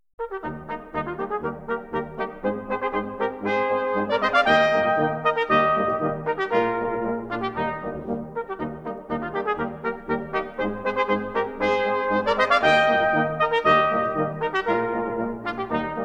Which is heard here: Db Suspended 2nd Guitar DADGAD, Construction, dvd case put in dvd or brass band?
brass band